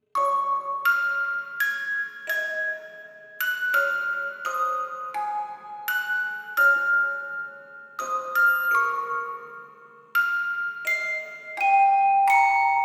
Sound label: Orgel; Atelier Shallie Original Soundtrack